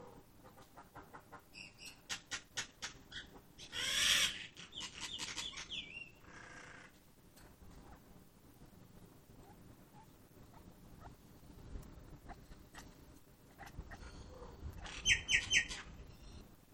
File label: cat twitter